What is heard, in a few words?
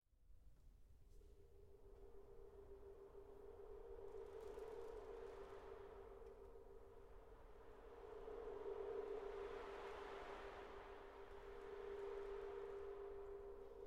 Clarinet Concerto